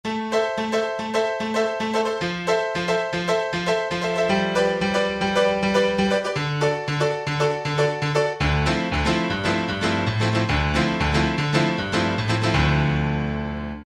Alesis QS8, House Piano; Factory Preset examples

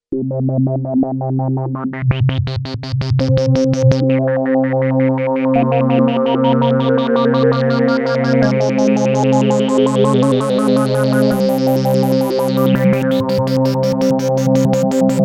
LFO-mod., Korg DW-8000; Factory Patches

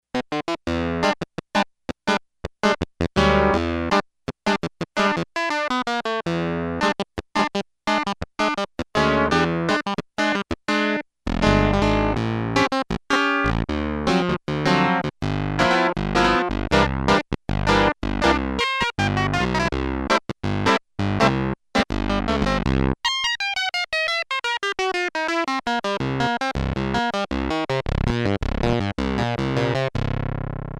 Muted Clav

